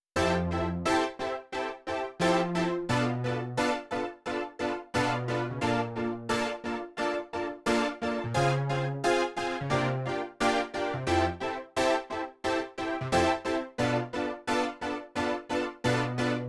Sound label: piano